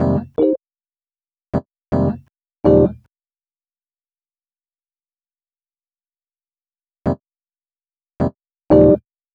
Rhodes, Cinematic Funk

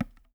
DHOLE, ETHNIC DRUMS; Spectrasonics; Liquid Grooves 1 & 2

